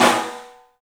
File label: STEEL, Drums, ROOM SINGLE; 3D Sound